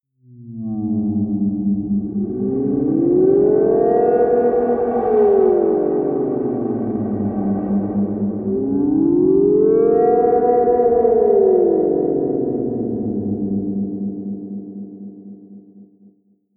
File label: swaying drone; musikelemente; drones